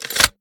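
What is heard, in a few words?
sniper first raise bolt pull; gmod; iw8; xmike109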